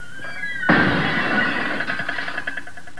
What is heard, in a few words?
door break